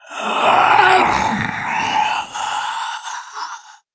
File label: female zombie sighted; nmrih; zerosiki; slender